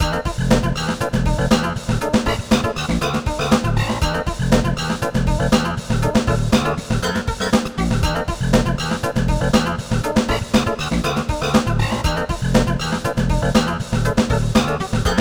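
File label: background music; Machi Unmei no Kosaten Tokubetsu Hen; PSP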